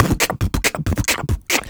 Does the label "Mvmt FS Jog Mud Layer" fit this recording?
No